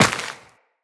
Step Dirt, Footsteps Walk; Tenno

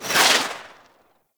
Entangle Projectile Pass By; Warframe; Oraxia

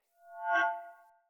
Great Sword Accent; Weapons; Swords; Dendrit Ara Great Sword; Accents

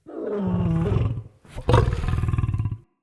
Wolf Idle Trapped Groan, Animals Wolf Vocals

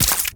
Arch Gun Primary Beam Impact Flesh; Weapons; Warframe; Archwing; Hildryn

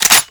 Clip Eject, Weapons; Warframe; Tenno; Dual Soma

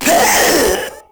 Proto Infested Runner Pain; Warframe

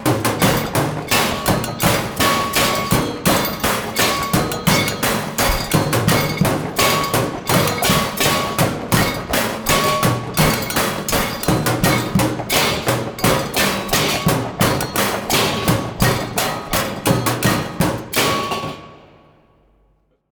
Vent Kids Hideout Music; Skeg

